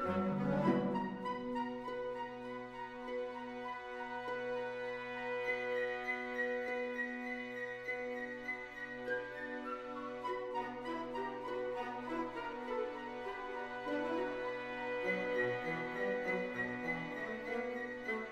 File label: Music Short Punctuations Worrying 50bpm Loop; asterix obelix slap them all 2